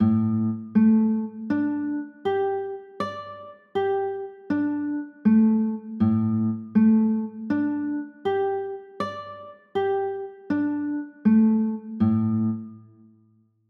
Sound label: a sus4, chords